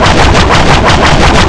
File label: whip sword stab loop all